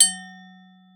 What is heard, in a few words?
F#3, vibes; Xsample VOL-4